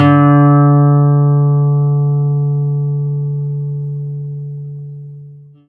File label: string